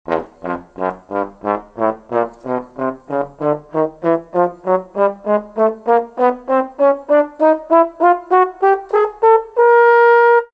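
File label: Trombone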